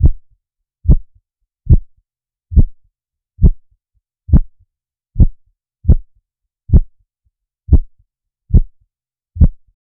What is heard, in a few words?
soft irregular heartbeat